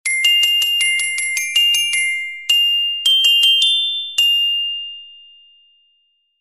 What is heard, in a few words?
A Short Song